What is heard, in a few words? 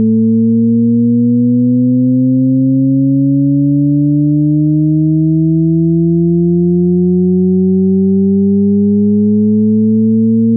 Risset Glissando